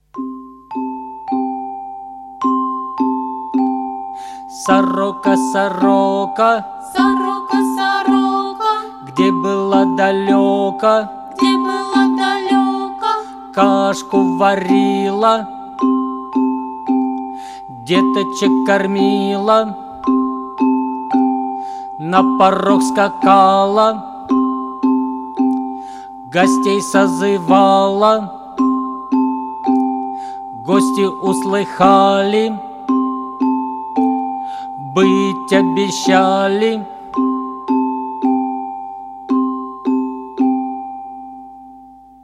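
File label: chant; popevka soroka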